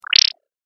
Chirp Digital Bleep; Robot Sound Effects; science fiction sound effect

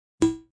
Pong; Pings; multimedia

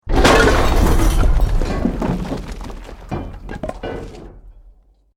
BUILDING COLLAPSE SCAFFOLDING SMALL; Created dramatic effects of buildings collapsing or breaking; Construction

